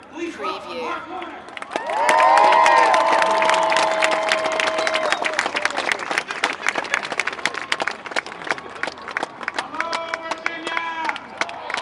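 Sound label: Political Rally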